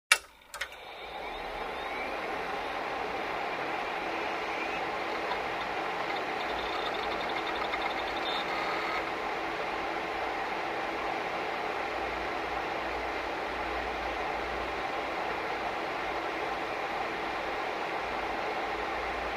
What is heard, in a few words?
Turning on the computer, fan noise, shutting down